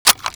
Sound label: m40a3 loop, foley